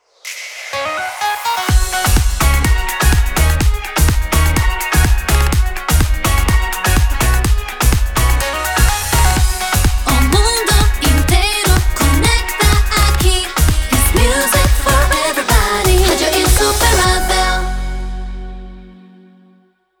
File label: Radio Jingle 125bpm Gb Ramp; Radio Insuperavel